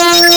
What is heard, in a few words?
FEEDBACK